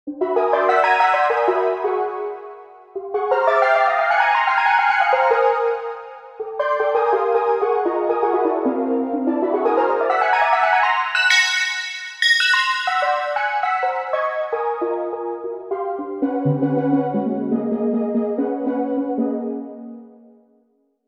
steel strange; usb ultrafocus

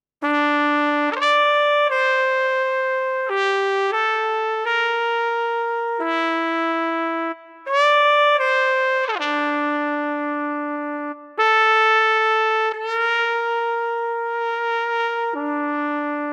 trumpet, Yamaha tyros3

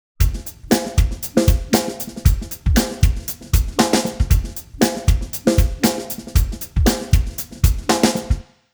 drums, timestretch 90; prosoniq time factory dirac

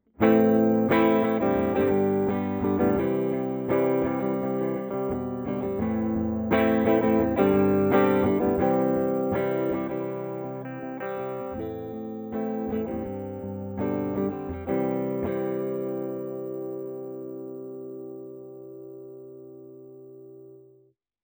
clean neck rythm; vintage

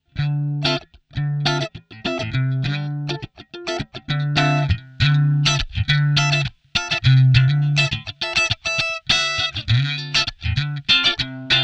graphic eq; behringer pedales guitare

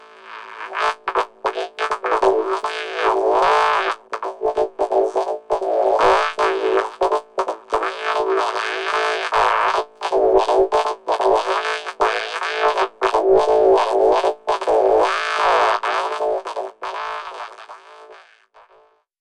vocodizer; plugiator

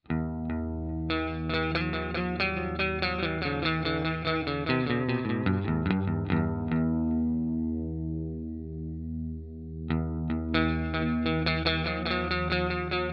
flutter; tech21

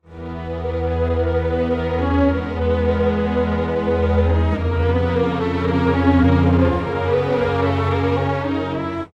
2, strings, pitchshift; prosoniq time factory clear scale

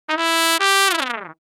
falls, trumpet; samplemodeling

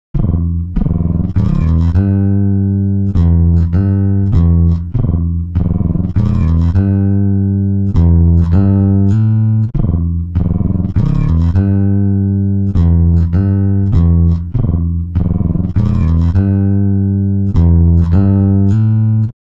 bass; timestretch

